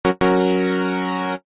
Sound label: multimedia game sound basic digital retro fanfare level complete achievement